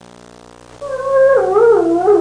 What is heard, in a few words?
Dog whinning long